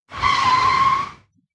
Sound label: sliding, sliding tires; sliding car sound; teststrecke game